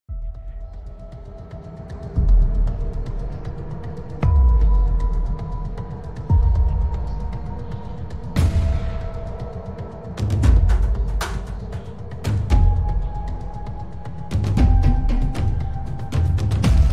suspense horror background music dark creepy cinematic